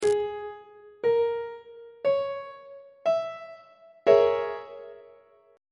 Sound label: b flat half diminished 7th 3rd inversion on treble clef